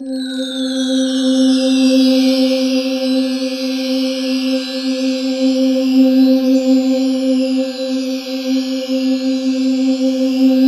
C4, SynthLine; 90 s Sample CDs; NiceBeats; SynthLine VOL-11

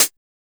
909 CH; BONUSHATS

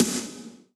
Snare, Yamaha PTX8

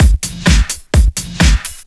Straight Loop 128 BPM, House

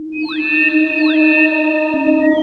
Ambi Cry; Zero-G Jungle Warfare 1, 2 & 3; Ambient

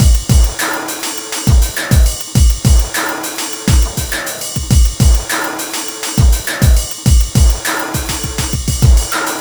Processed Beat, Drums & Percussion

